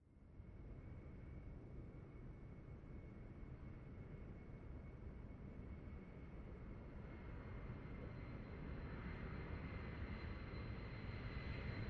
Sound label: Lrg Jet Landing Touc